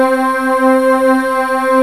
VOICE UNI; B VOICE

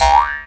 D JAW, Primitive Instruments

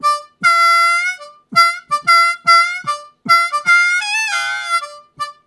High Bending, Harmonica; Zero G

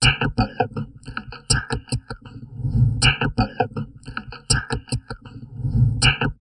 TALK, Drum Loops Crazy Processed

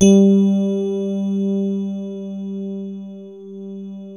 DX Rhodes; Best Service Advanced Media Trax Modern Composer

